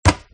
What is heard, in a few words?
box in